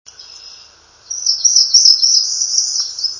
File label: parula northern, Typical ascending song with explosive drop at end.; Southold, Long Island, NY, Bent Tree; birdsongs